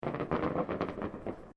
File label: Fireworks Small Display Crackles; Fireworks (Sound Effects:Backgrounds)